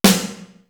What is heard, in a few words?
CHROME, TOM; East West - Global Sounds; D4 BD SD TOM